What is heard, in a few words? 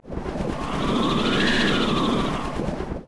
wind sound; koala seasons; raylib